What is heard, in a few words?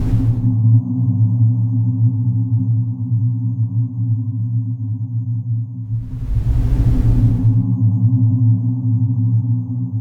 Horror sound